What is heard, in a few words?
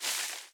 grass cut